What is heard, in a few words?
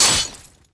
cbar hit, weapons; svencoop; ww2projekt